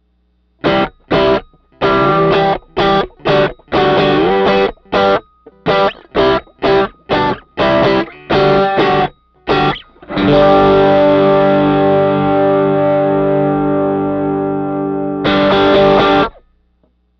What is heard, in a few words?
SM57 Overdrive